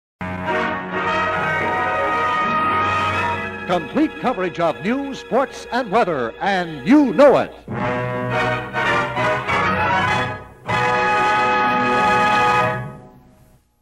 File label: news close